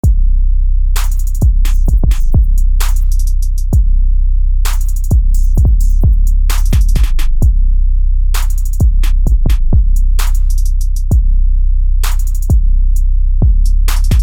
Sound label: Drum Kit